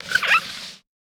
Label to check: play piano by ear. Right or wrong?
Wrong